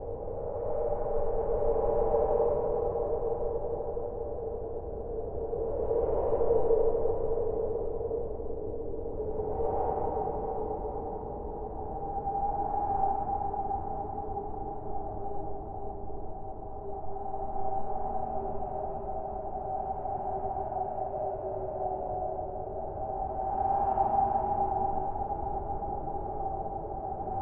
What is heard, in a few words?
amb wielder temple